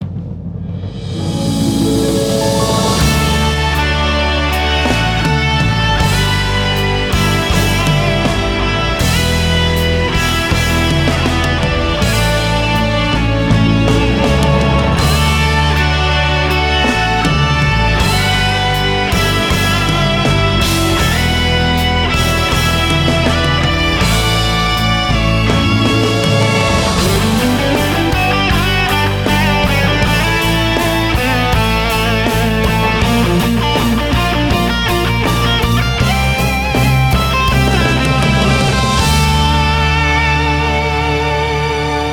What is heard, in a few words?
Prize Gold Jingle